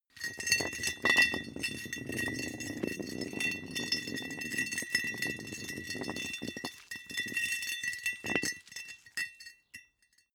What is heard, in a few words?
small metal rolling